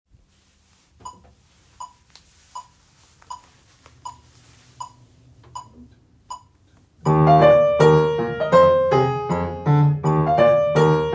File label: Accompaniment